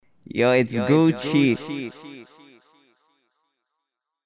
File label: drop